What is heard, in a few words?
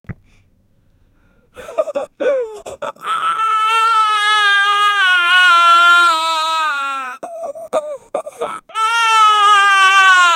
bear son cry and